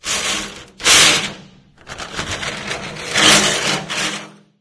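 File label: zombie door scrape loop; video games; city; source engine; episodic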